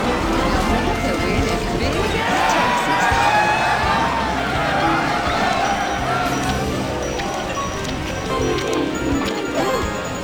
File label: Wolf Loop; Real Deal Slots; Lobster Larry; SlotsOL